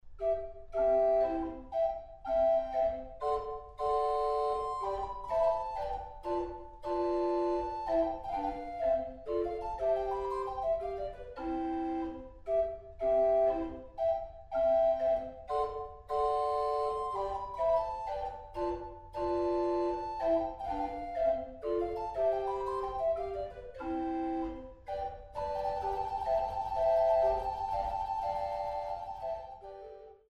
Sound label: Menuette